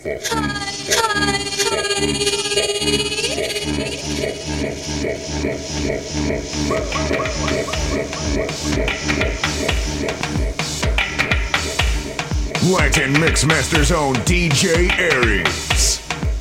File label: Latin House Mix